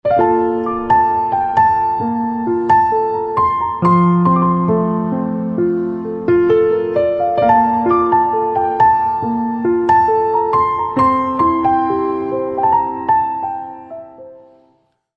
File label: Piano Music Instrumental Ringtone